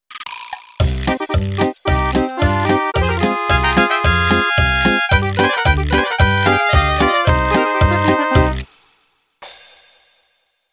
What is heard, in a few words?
Mariachi Intro